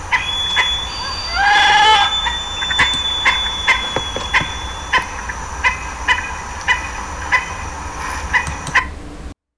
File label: Undulated Tinamou